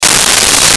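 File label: Reverse Cymbal; Nintendo; July 2020 Gigaleak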